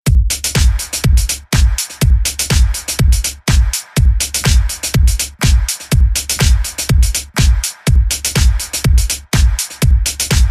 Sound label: Ever Beat